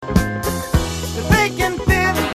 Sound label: F sharp To F